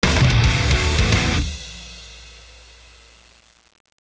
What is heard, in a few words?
deathcam, musickits; csgom; cstrike